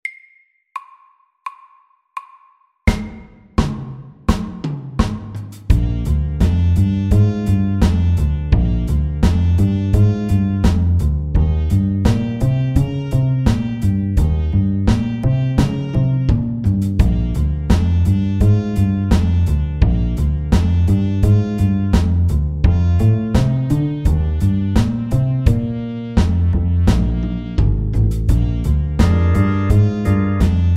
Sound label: Walking bass, 85